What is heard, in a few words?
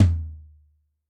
Dry Lo, Tom, Yamaha DTXpress-II